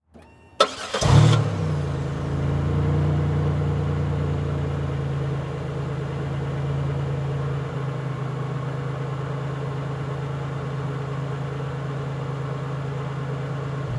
Transportation Car Monte Carlo Start Idle Off Mic In Rear